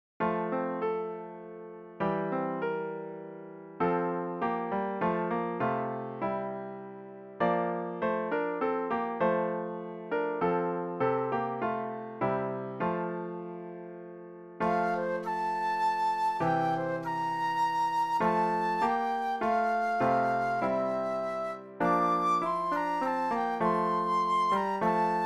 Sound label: Flute Duet and Piano; christmas